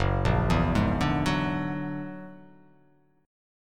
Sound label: F sharp Augmented 9th F sharp, chord